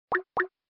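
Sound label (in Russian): zvukovie effekti opovesheniya po skype, notification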